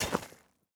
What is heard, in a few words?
plant seed; Rust